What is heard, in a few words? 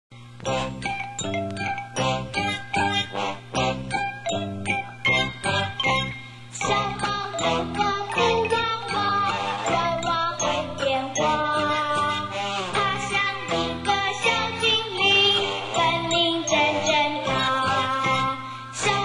old cartoon; 小寶歷險記; 華視; 卡通